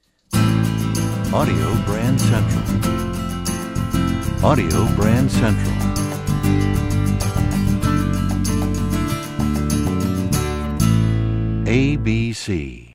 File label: Desert Wind, radio jingles